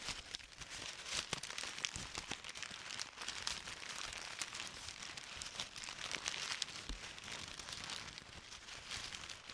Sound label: Paper Rustling